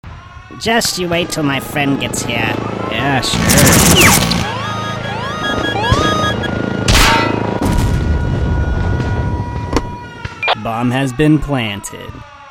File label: Minigun; Toppat King